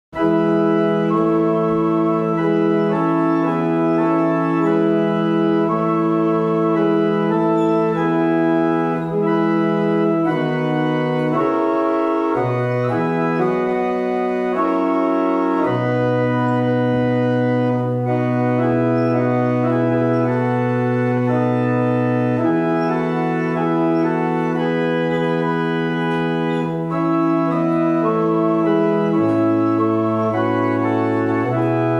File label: Sicilian Mariners, barrel organs; Muchelney